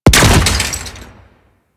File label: Target Explosion A; Warframe; Ambience; Tenno Challenges